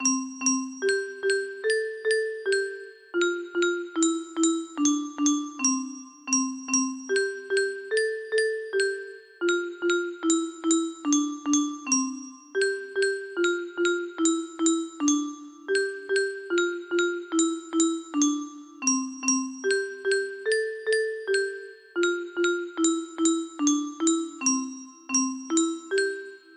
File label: estrellita midi